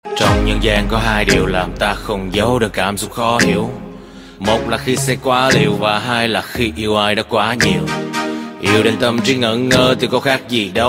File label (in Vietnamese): Nhac Chuong Rap